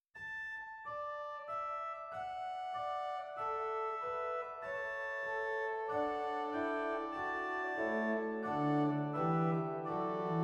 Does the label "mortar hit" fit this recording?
No